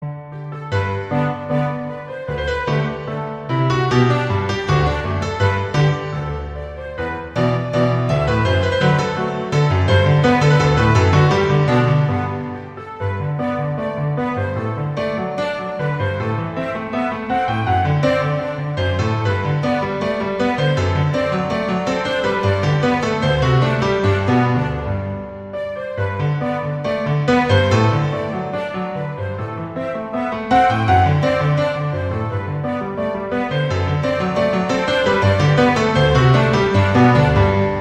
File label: Orchestral Version